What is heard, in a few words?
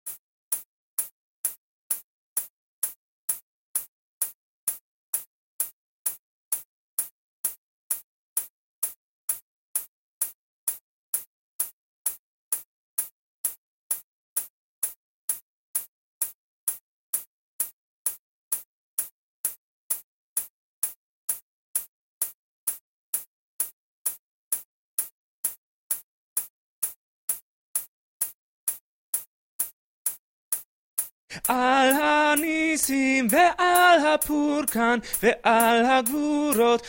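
tenor, choir music; BCC choir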